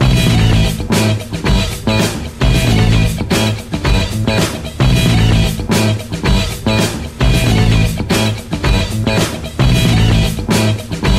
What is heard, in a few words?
Crunge Guitar Loop